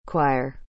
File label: Choir